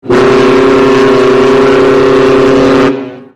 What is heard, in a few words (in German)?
kreuzfahrtschiff klingelton; deutschland